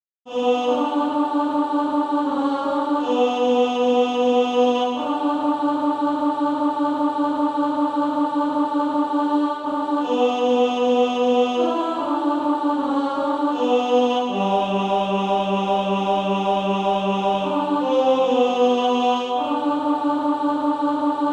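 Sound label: tenor, SATB Practice Music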